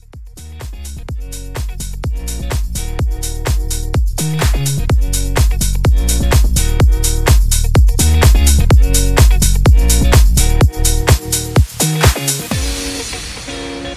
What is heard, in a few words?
Transition 100 126; Transition Edit